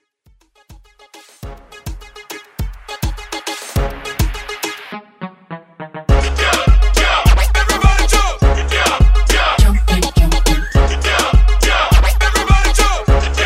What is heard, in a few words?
Moombah Hype; Reffix